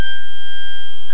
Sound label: steady